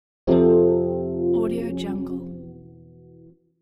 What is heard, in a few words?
trippy psychedelic cartoon whoosh